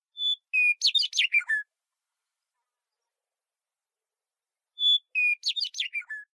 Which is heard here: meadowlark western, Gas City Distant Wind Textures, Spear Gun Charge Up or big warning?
meadowlark western